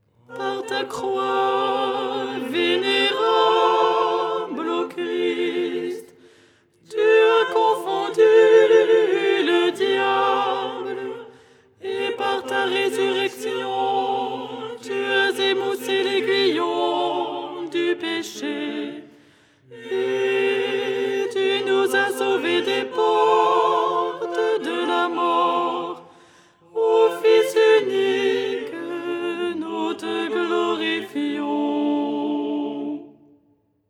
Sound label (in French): alto; Au lucernaire, 1ère stichère du dimanche; Les 8 tons en français